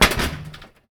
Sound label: metal grate, player footsteps